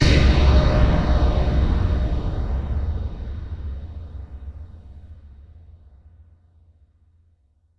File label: VFX Atmos